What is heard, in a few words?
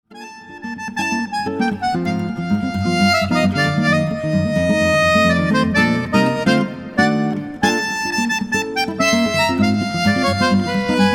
Samba